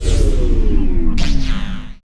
Destroyer Hurt; Sid Meier's Civilization 4 Complete; Beyond the Sword; Final Frontier; Units

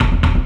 level, scavenge, music; Left 4 Dead 2

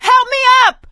ledge hang end; Left 4 Dead 2; producer

